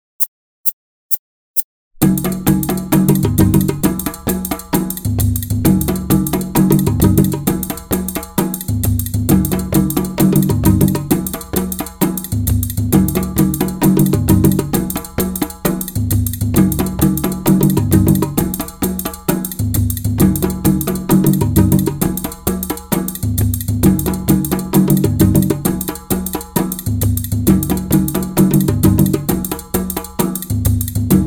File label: Chaco Verde